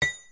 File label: hit, metal